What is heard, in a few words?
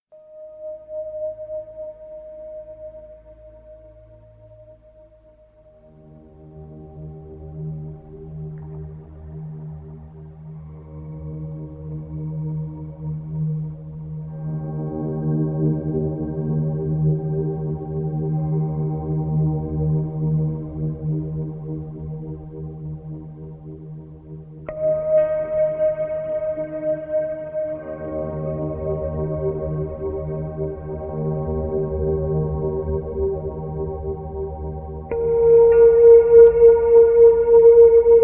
Tibetan Song